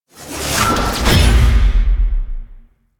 Simple Teleport; Enemies; Warframe; Pax Duviricus

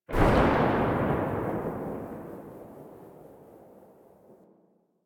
Archgun Charged Tail; Warframe; Archwing

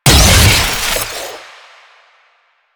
Explosive Impact, Weapons; Warframe; Acceltra Prime

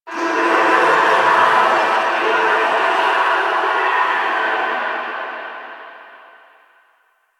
Infested Distant Sewer Grate Screams; Warframe; Ambience; Nineteen Ninety Nine; DE

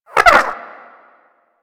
Wyrm Idle, Vocals; Warframe; Pets

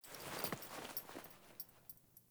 Foley Actions Wolf Jog Start, Clothing; Characters; Player

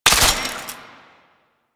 Belt Fed Rifle Pre Fire; Weapons; Warframe; Tenno; Frumentarius

